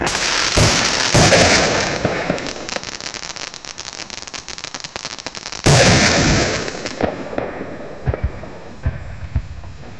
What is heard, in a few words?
generate a 5 second firew, event